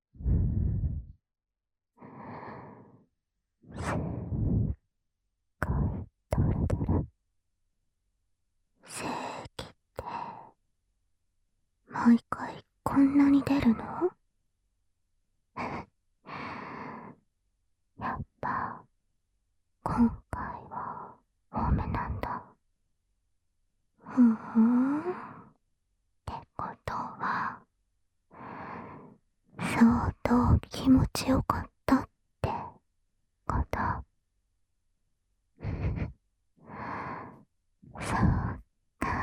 お布団の中でお掃除フェラ, ASMR; 反転

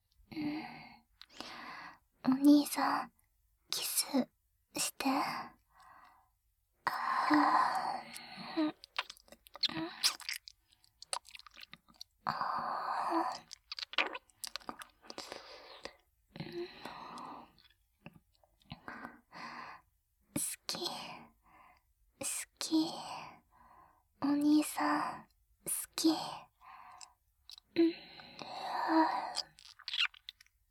耳舐めダブル穴セックス, ASMR; ルリちゃん

